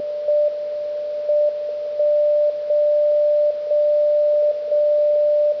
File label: mode WWVB